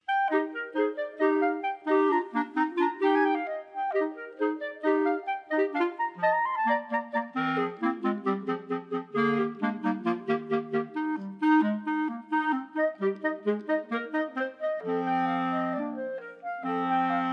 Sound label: Allegro, Clarinets; Haydn